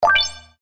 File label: bounce